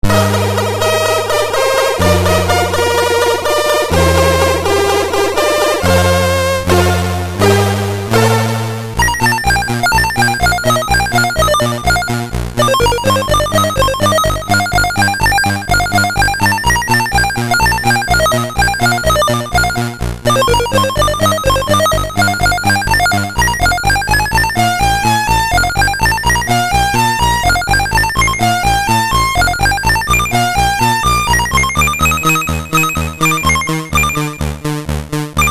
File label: C64; Bubble Bobble; Datormusik